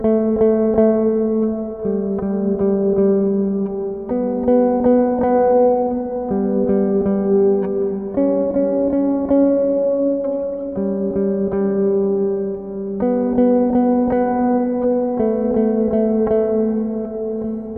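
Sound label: Sad bass 3 quarter time 81bpm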